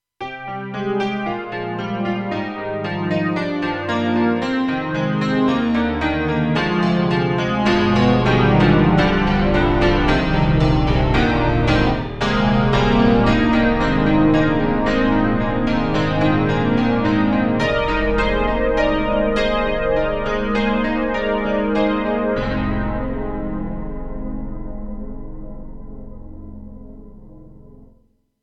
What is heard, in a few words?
piano haven, KORG 05RW